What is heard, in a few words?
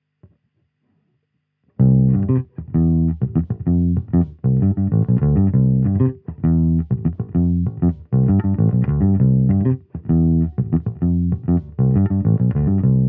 swr workingman, bass